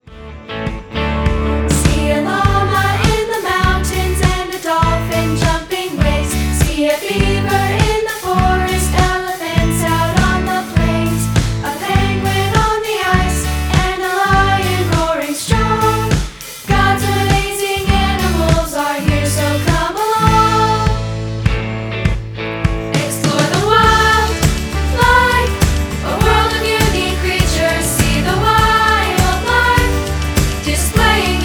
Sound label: Band